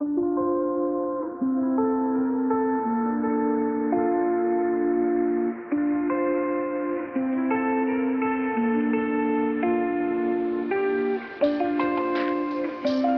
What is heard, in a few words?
Tranquil Waves